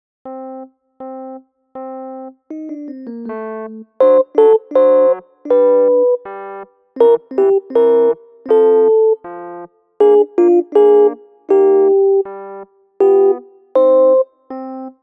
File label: midi sop